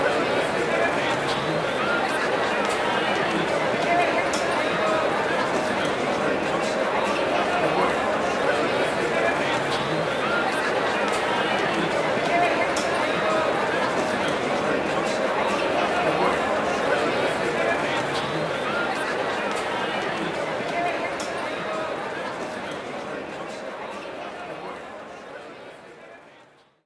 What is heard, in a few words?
Music